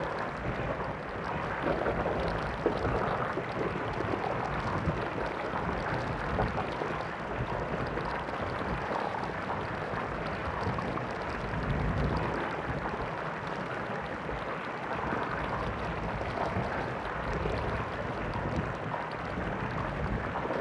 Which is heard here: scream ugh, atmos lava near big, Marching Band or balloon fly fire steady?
atmos lava near big